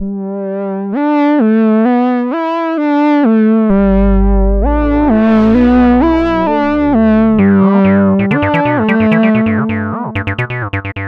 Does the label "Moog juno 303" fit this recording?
Yes